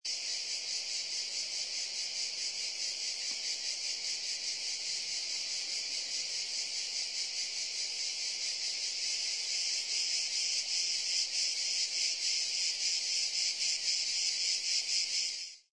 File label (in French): cigales